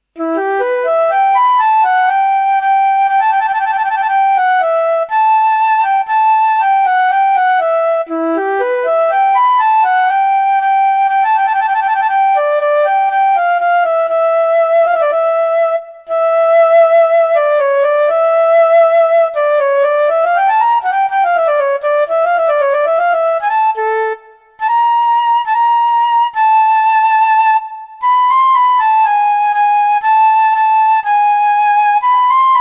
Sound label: Klezmer for Flute